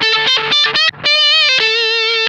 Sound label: BIG LICK, Funk Guitar; Zero G - Funk Guitar